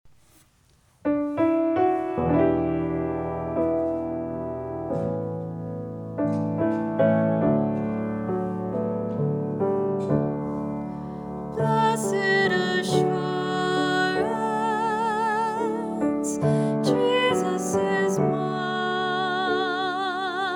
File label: tenor